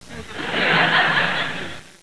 Audience Laugh

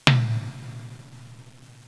Drum

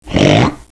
Snort

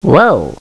Woh

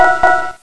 Kind Warning Beep

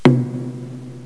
Tump

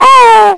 Baby Seagull

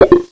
Bi Pop

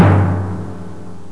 Drum

